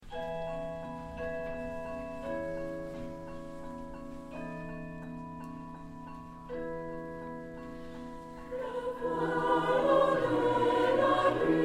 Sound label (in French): choeur; angelus; 2011 festival mon pays